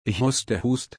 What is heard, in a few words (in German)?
husten